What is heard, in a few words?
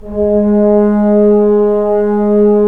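brass trombones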